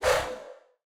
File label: Ui Default Window Open; android; Portal Quest